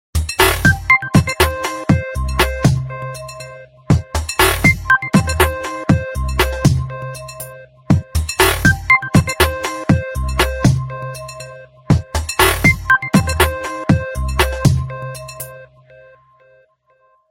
nokia p1 tone ringtone

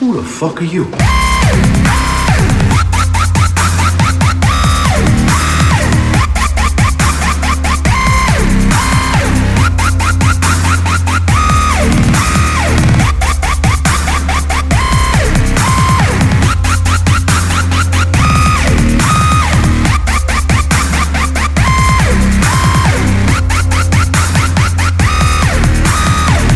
dubstep ringtone

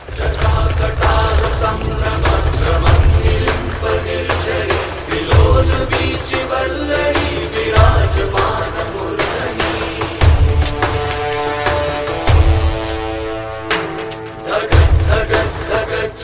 mantra; bahubali

